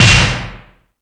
Percussion FX, E-MU Pro-Cussion

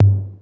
LO TOM, COMPU DRUMS; Crypton - DrumBase5000

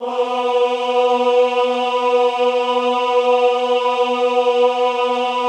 AHH B2, Classical Choir; Best Service; Extended Classical Choir; AHH MIX

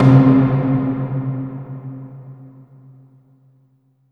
hollow pip, amb. mallets; Spectrasonic Distorted Reality 1

